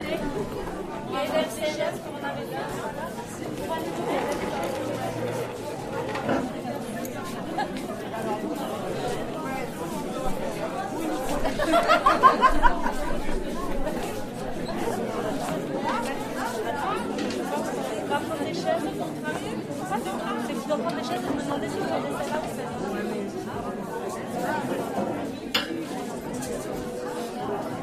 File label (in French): concert chants communs